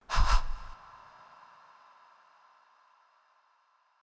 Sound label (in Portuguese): duas respirações rápidas